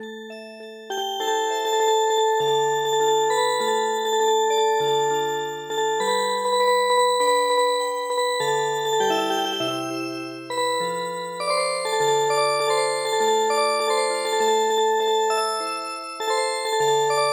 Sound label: Music Box, traditional